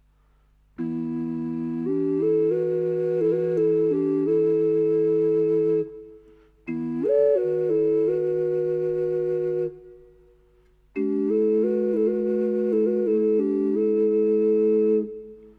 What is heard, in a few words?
soundtest 3tone